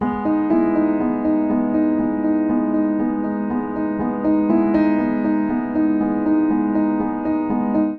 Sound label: Ostinato G# Minor 120 bpm, Upright Piano; Contemplate; Upright Piano by Spitfire Audio; Ableton; Factory Packs